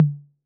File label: Tom Mid B 808, Clean, Digital